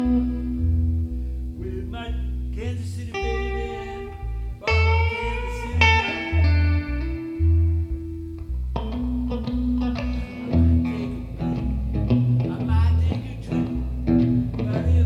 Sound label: kc blues; r1 studio sessions